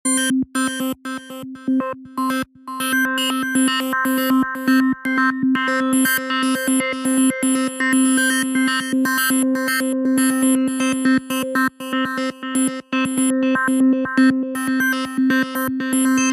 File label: future connect, Ringtone; Apple